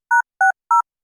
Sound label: Vol UP; 補聴器; 各プリセット事にボリューム調整ができます。; AMP補聴器調整用